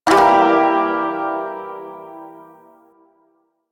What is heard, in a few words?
Shawzin Phrygian Chord C, Music; Chords